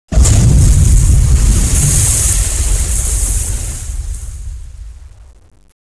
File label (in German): wasserbombe; firearms